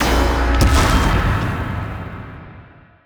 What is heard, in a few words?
Sticky Wild Quickspin Attention; netent